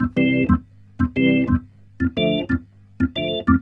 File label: Roots Reggae, B-3; Sounds Good